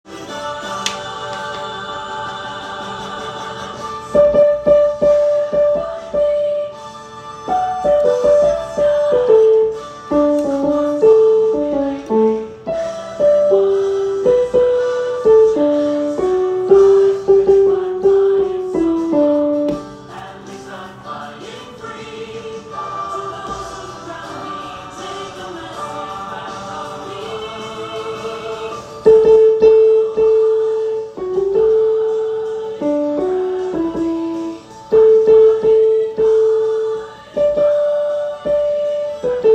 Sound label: 2nd Soprano